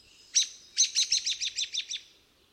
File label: robin whinny